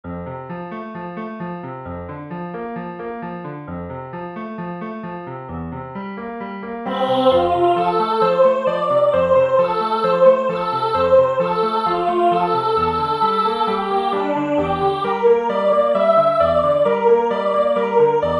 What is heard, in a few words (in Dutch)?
voor zang piano; het tweede kinderliedboek